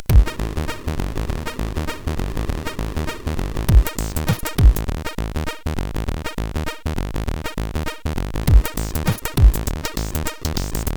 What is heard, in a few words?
Kick 2, CSG8580R5